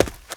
Player Run Dirt

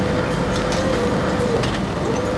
machine binder

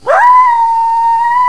were die, werewolf

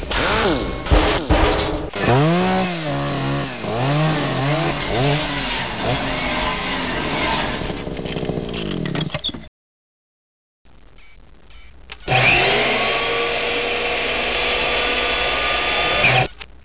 drill mix

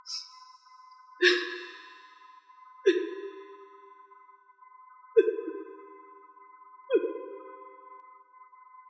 sobbing; ambience

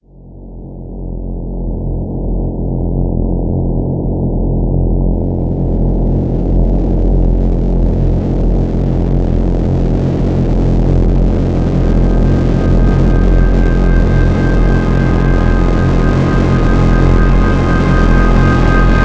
sonata; ambience

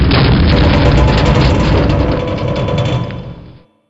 mortar metal creak

